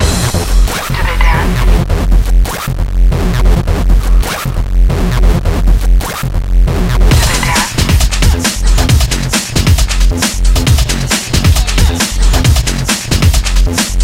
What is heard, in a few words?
Electro Mania